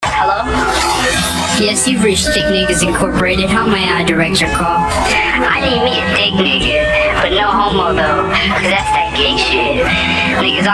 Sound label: beat tape